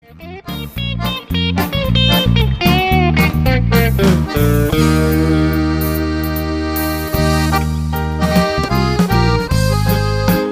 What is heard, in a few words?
Waltz